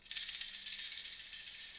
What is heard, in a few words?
ROCKET, SHAKER FX; Spectrasonics; Heart of Africa 1